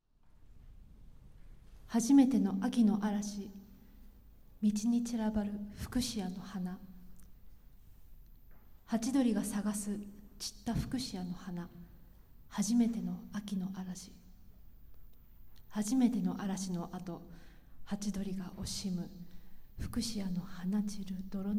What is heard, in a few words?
For Clarinet, Violin and Piano